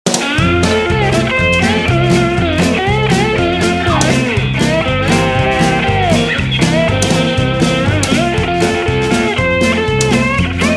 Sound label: Moan